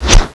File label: knife stab; weapons; cstrike